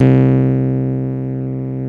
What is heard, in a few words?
C1, Keyboards Old School; USB Soundscan vol.09; AKAI